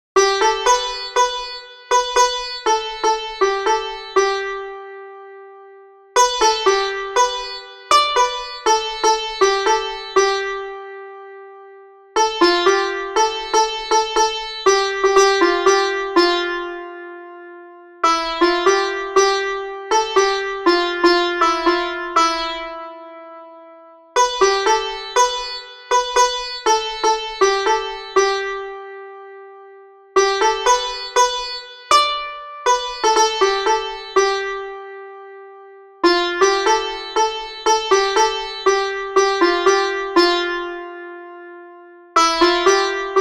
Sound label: santoor